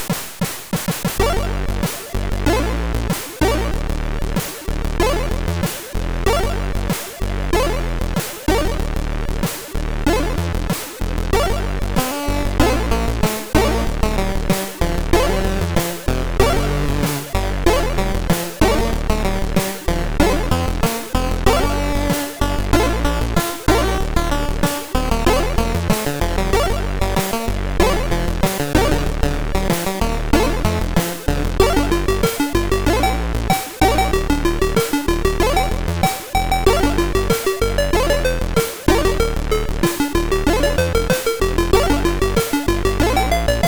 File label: Relaxation, MOS6581R3